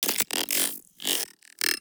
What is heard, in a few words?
glitch